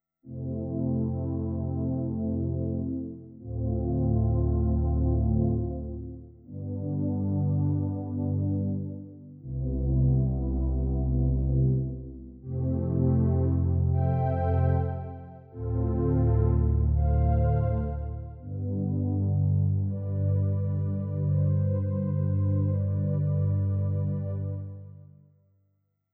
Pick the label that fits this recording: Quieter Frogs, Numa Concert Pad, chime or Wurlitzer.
Numa Concert Pad